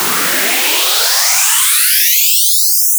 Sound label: Noise Cheby A Highpass; synthesizer; lange-nacht-2009